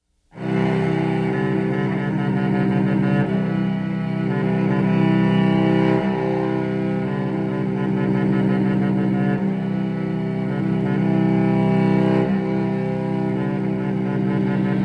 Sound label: Drone II Flutter